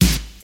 airy sizzle snare sound a sharp key, steel snare drum tuned to the A# note